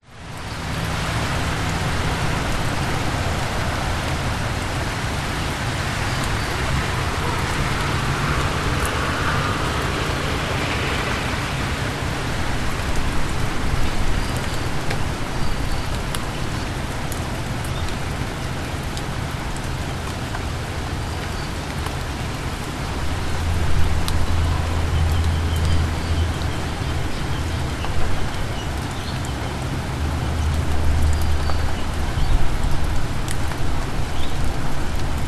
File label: ambience suburban raining cars distant birds